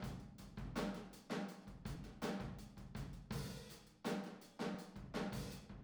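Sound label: Boogaloo, Room Stereo, 82 BPM; Drums; Verse; Sonic Foundry; Drums from the Big Room The Mixes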